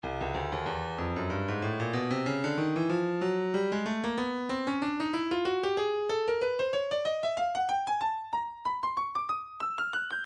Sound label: breakbeat 094 bpm; Stylus; Head Knod